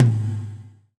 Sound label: GATE TOM, Drums Acoustic; USB Soundscan vol.10; AKAI; 90s sample CD; GATEKIT 1